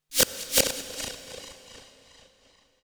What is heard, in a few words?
FX, ambient, 160; eJay